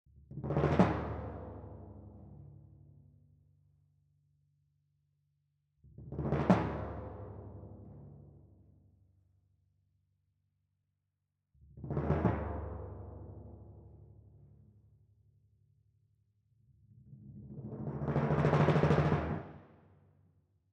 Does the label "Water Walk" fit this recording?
No